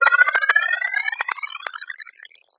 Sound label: reverse tinkle; Scars FNC SFX